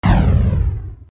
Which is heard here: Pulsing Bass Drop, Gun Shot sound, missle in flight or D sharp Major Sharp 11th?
missle in flight